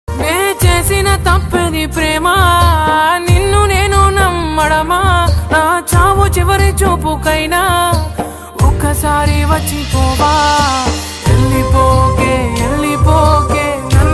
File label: love breakup ringtone